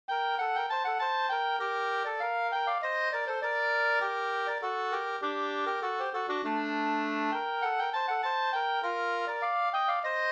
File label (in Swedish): polska Senpolska